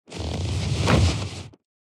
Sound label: fall down